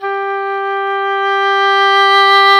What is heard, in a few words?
OBOE, Orchestral Winds, Dry; Roland LCDP04 Orchestral Winds; Oboe 4-8; Oboe Dry 6